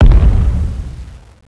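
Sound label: piano gun explosion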